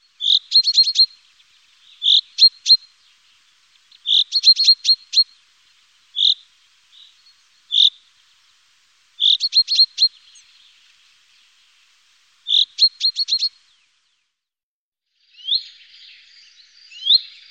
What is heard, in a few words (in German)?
Buchfink